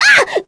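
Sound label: Down, Korean; voices; heroes; Sonia; kings raid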